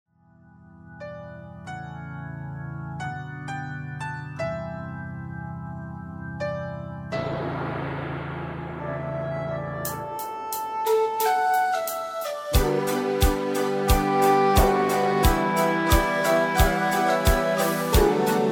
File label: Flute Dreams; musique instrumental